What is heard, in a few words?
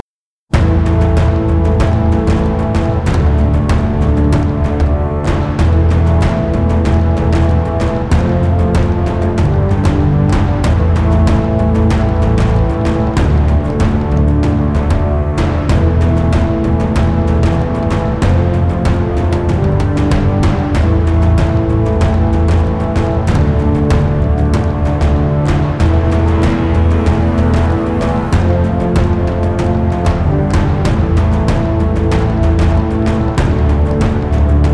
Without Bagpipes; Moll Theme; Real Warfare 1242